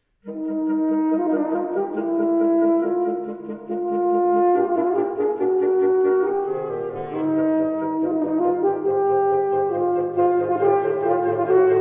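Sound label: wind quartet; gambaro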